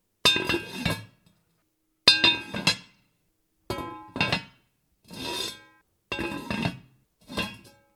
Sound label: Lid Ironpan